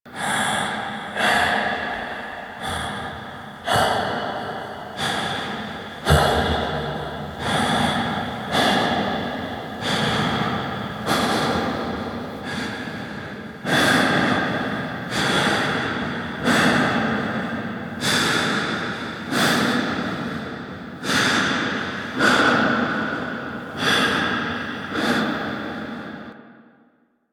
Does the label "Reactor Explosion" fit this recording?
No